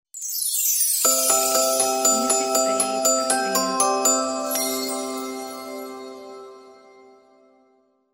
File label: Jingle Bells Logo